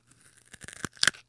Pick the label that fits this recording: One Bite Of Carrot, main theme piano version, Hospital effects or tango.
One Bite Of Carrot